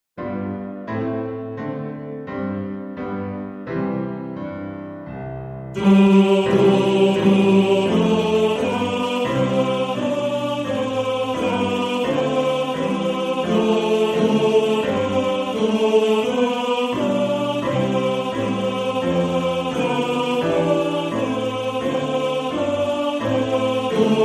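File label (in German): Tenor 2, Männerchor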